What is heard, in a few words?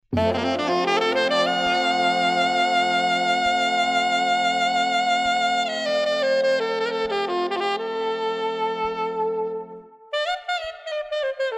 Indinski cocek